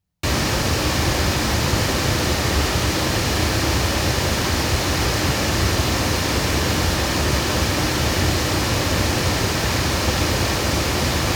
loudspeakers; CT Proscenium; microphones; Crowley Tripp

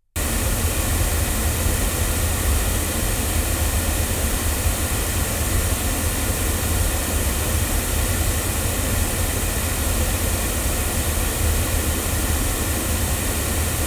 MT97 omni loudspeakers; TSM

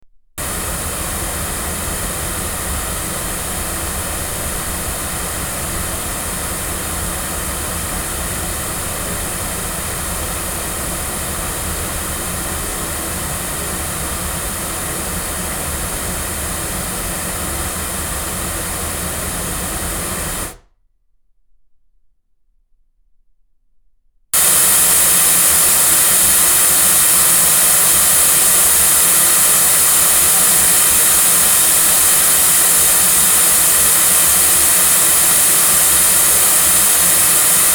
Avantone BV-1 omni loudspeakers

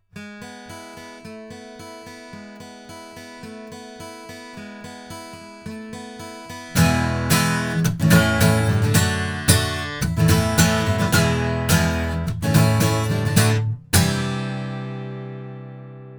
guitar; LineAudio SM6i; microphones

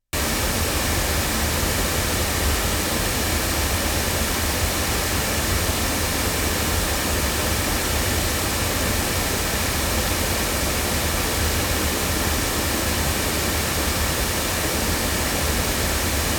SKEC010B loudspeakers; JJLabs

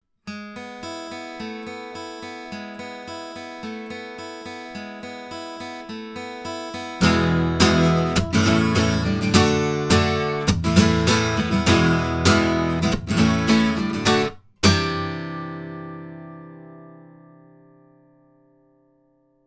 Violet Flamingo jr guitar Gibson, microphones

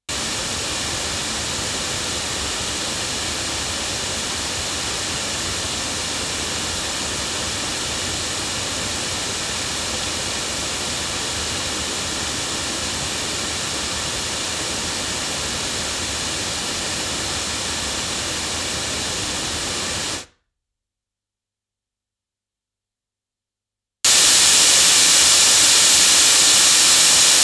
Golden Age D1 loudspeakers

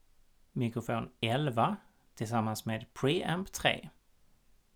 mic pre

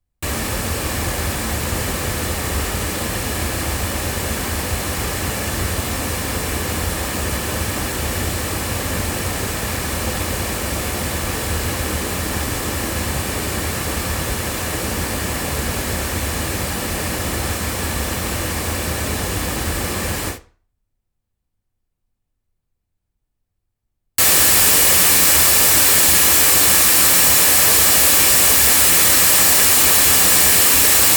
loudspeakers; LineAudio CM3; microphones

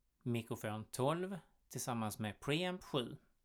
mic pre

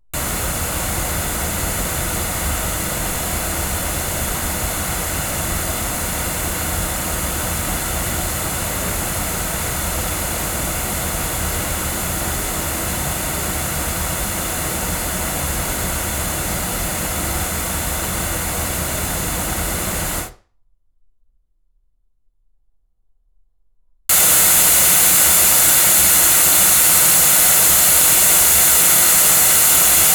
Blue Reactor omni loudspeakers

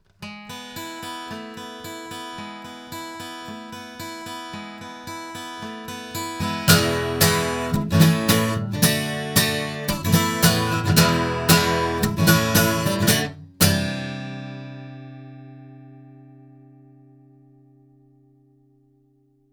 MT97 8 guitar; TSM

